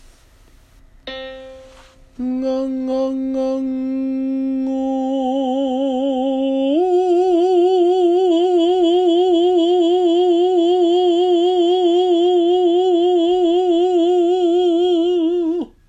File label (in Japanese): 跳躍音程 女性